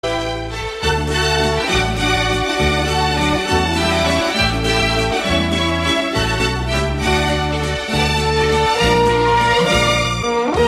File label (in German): Bayern Hymne